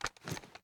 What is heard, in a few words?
ar akilo47 drum reload twist; weapons; viper